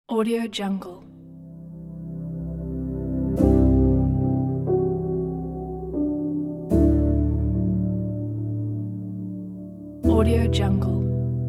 piano ambient

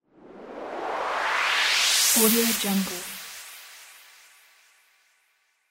rise effect

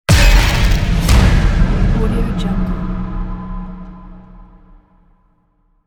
horror tension thriller

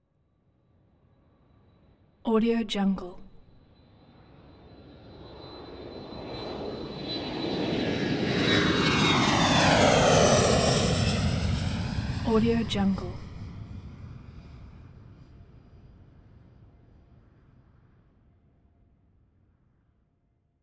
airplane passenger jet take off

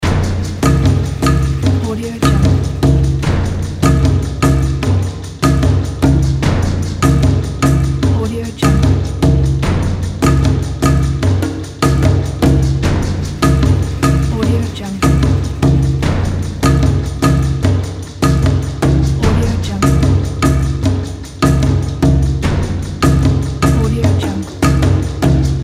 adventure jungle drums loop

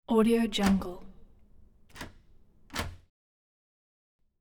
door knob jiggle with ac; hollywoodedge

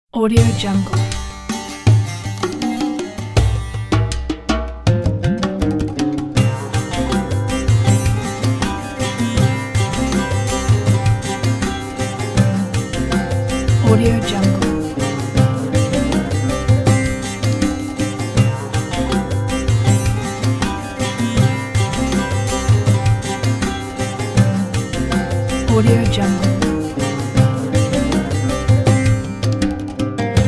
arabian

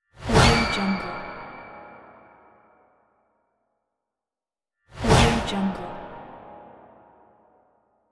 jump scare

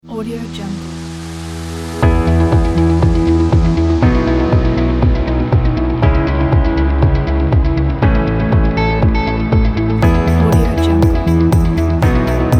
inspiring uplifting corporate

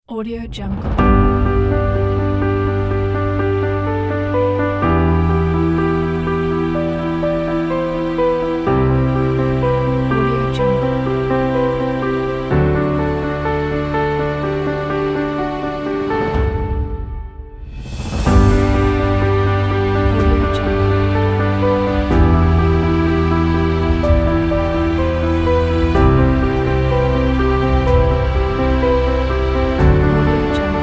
inspiring and motivational storytelling pack

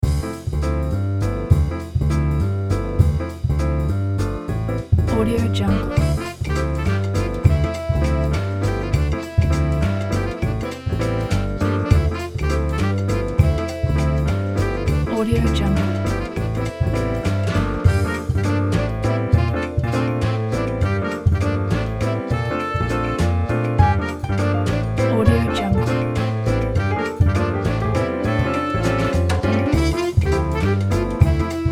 jazz five four intro

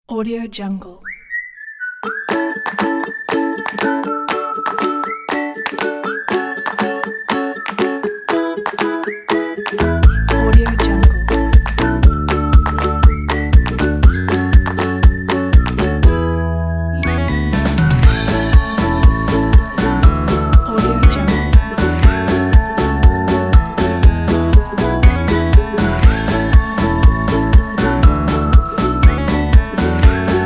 happy ukulele

